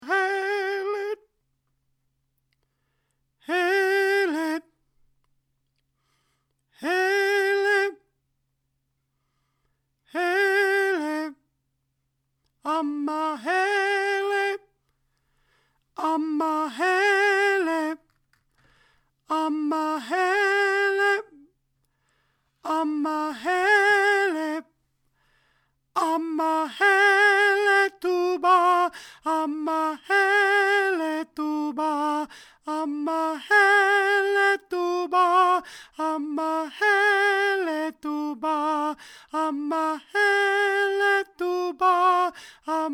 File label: alto